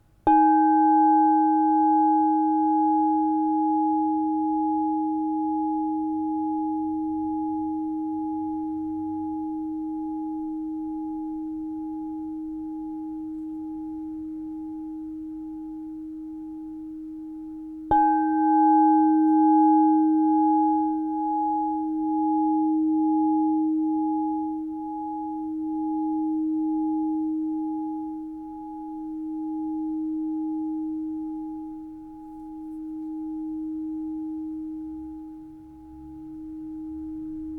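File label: 7inch D sharp +15, crystal bowl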